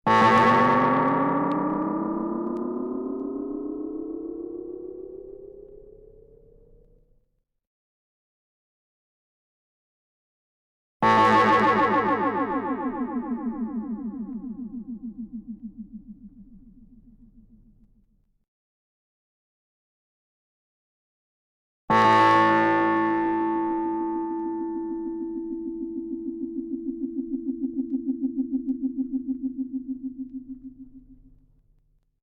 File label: Shock Chords; Electrosonic